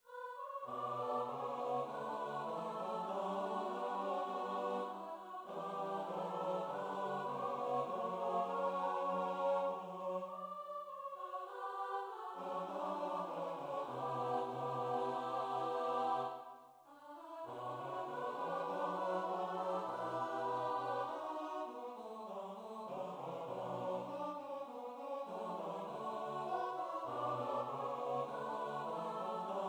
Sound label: choir